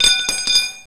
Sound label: pipe, metal & wood; Roland - LA Composer vol. 1